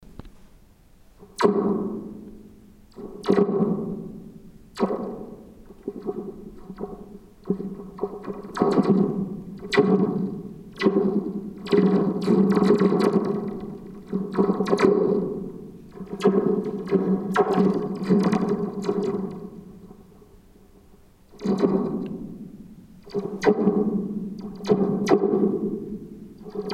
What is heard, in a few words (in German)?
Donnertrommel Laser